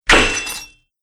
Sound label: Hand Axe Hits Chainmail; Battle